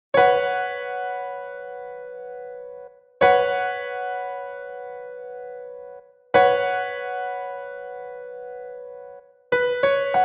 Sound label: Bbsus2 Piano Chord